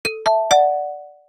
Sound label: Alert; Apple Store